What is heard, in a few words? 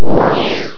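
missile, sound effect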